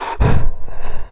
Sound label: breathe; PC Gamer IT CD 41; Daikatana; superfly